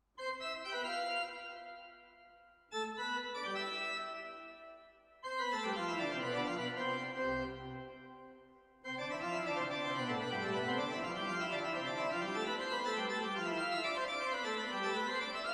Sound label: mictest organ, microphone comparison cardioid; rode